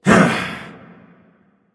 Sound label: grunt attack; smite; anubis